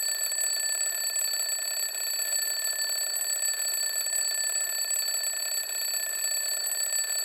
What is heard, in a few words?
Clock Alarm (Low Loop)